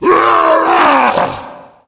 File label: tank throw, yell; voice; Left 4 Dead 2